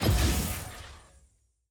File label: button click; draft; ban; champ select